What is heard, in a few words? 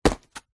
foley footstep single barefoot walking cardboard; zapsplat